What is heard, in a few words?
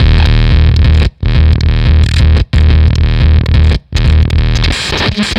Fuzztest drum fuzzfactory